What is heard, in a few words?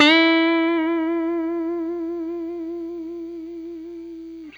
BLU BEND UP, Guitar & Bass; 90 s Sample CDs; Quantum Leap - Guitar & Bass